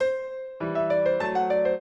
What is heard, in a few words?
piano; Mozart